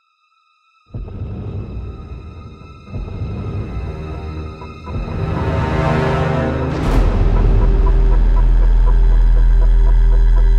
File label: Tower Explode; Mass Effect Andromeda